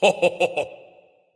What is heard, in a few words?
"heh heh"; smite; voicepack; ullr